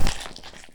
crush; ASCIIpOrtal

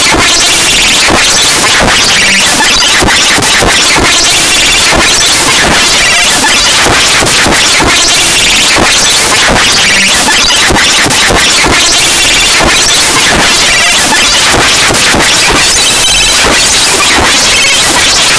urban shuffle

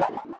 portal shot; ASCIIpOrtal

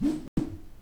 trampoline; hex-a-hop

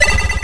demon bullet; mazeofgalious

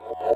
collapse portals; ASCIIpOrtal

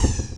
dispenser; ASCIIpOrtal

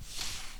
sound ice; hex-a-hop

